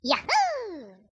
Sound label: yahoo; bouncy golf